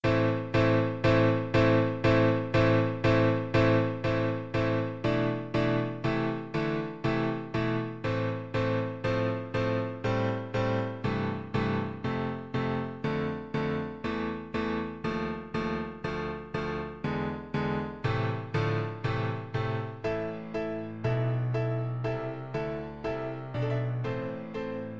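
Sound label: viola karaoke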